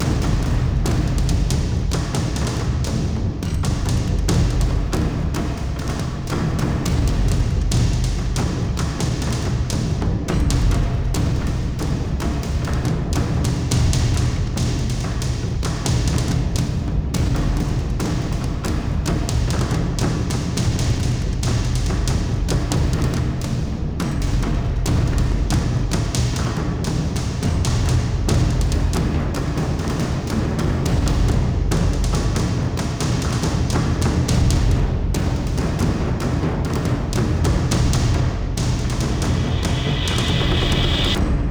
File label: Burst Drum